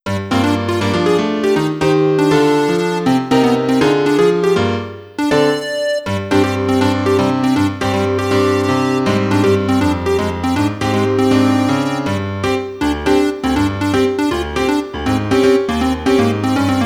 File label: Jazz Mood; Neo Heiankyo Alien; BGM remix; 8bit chiptune arrangement; Heiankyo Alien NEO de Jazz Mood